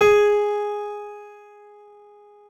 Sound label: piano